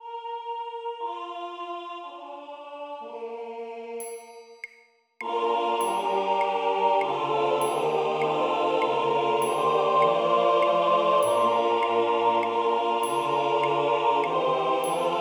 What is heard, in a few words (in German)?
chorstimmen SATB; sprich-ja-zu-meinen-taten; BWV 0194